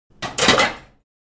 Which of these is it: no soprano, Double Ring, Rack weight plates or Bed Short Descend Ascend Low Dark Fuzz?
Rack weight plates